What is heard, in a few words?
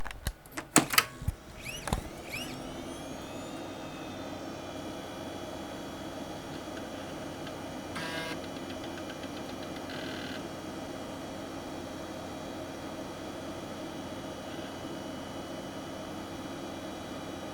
486 boot hdd